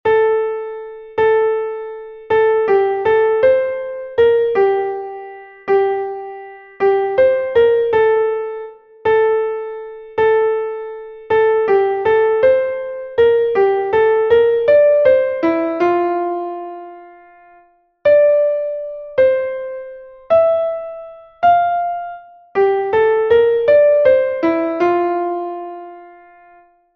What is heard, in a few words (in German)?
klavier; MelodieMeister